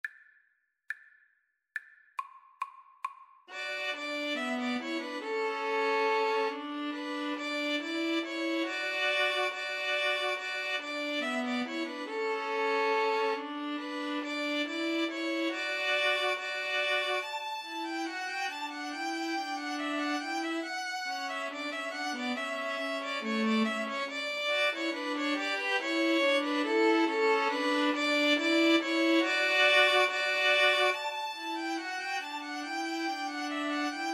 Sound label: ding dong merrily, String trio; Slow Playalong